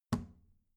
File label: plastic trash can close soft